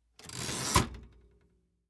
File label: front cogwheel movement